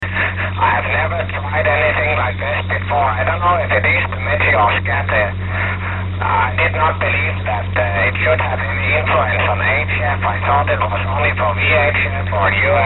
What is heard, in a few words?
meteor